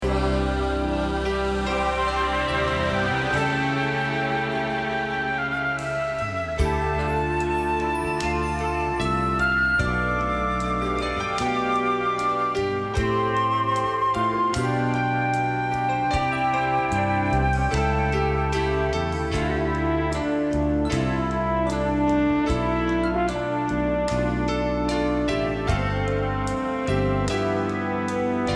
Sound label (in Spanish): Contralto